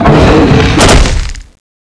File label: skill tantacle, zombie giant; dione